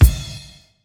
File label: silky kickdrum sample b key